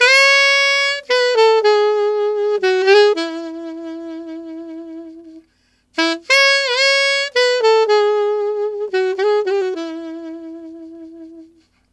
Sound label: Alto Ballad in A maj, Sax; Sonic Foundry (Sony Creative Software)